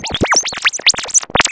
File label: Rubber Mess, FX Atmospheres; Zero-G; Soundclash Nu Dance Electro; Acidized Wavs